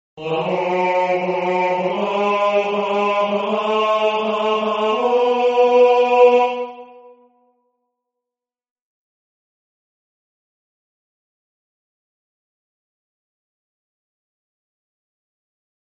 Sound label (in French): basse